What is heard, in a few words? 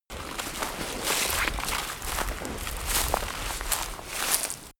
rustling undergrowth